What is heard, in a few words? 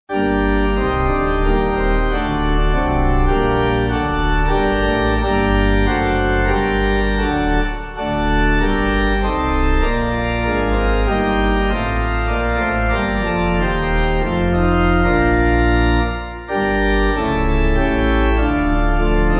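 Pipe Organ, Music Backing Files for Churches; O dearest Lord, Thy sacred head; Nun Danket All 8.6.8.6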